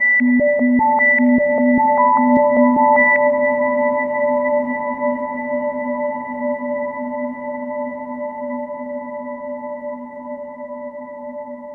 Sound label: Overlay Tone